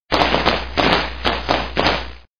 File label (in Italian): armi